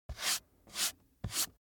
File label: PAPER Book Dust Off Sequence